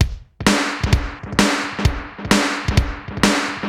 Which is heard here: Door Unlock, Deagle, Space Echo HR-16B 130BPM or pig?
Space Echo HR-16B 130BPM